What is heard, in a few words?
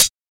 dampened closed high hat sample D key, Royality free foot hi hat sound tuned; hat samples